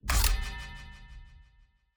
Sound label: battle preparation begin